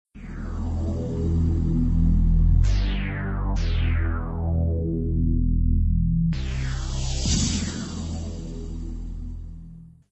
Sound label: Creepy Techno